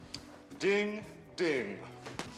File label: ding ding